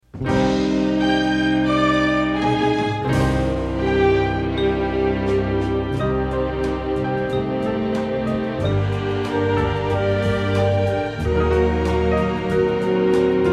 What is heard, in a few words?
Stereo Band Track; Pilate